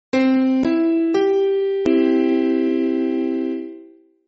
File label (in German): C dur, konzertina; kunstklang